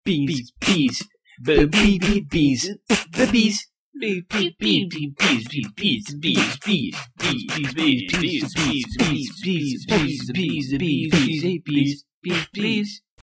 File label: Bees (Beatbox Mix)